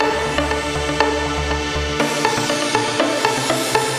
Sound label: (arp) ol school; Sonic Foundry (Sony Creative Software); Ugly Remnants VOL-2